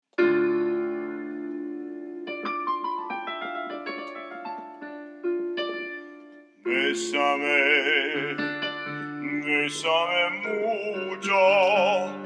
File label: piano